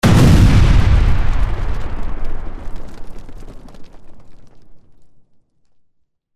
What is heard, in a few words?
TNT; Microsoft Studios; Age of Empires III - Complete Collection; cinematics